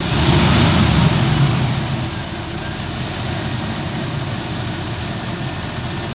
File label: Cadillac Low Rev Idl L